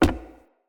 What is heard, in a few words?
Unarmed Punch Impact Wood Hollow Large; Weapon Impacts; Player; Environment